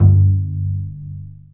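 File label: Bass Pizzicato G0; Multisamples; Digital Sound Factory Virtuoso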